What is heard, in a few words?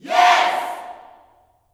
"Yes", Vocal Gospel yell shout; Spectrasonics; Vocal Planet Vol.1 Gospel; akai